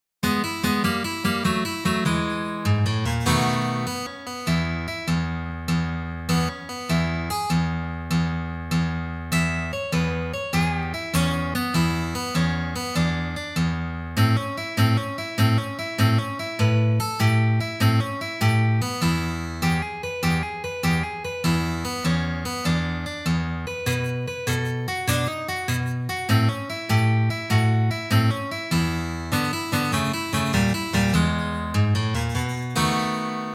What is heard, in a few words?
Classic Fingerpicking in E